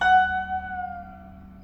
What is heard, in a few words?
downward portamento